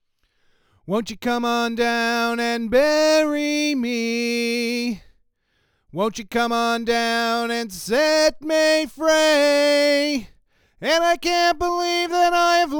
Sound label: Apex C12 Mod Fig8 Vocals; APEX 460 TO C12 DIY MOD